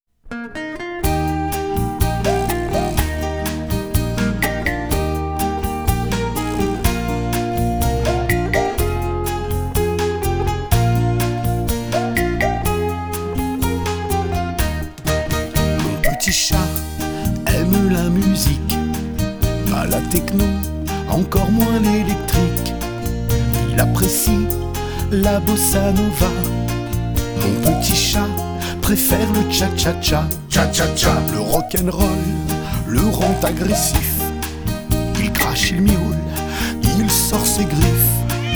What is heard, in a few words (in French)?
chat chat chat